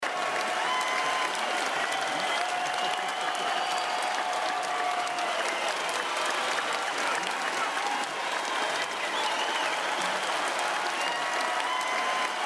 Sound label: crowd; Lucha Rumble